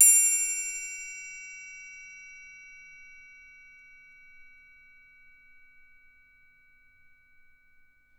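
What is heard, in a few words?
SM TRI left, percussion; Miroslav Vitous - Percussion & Harp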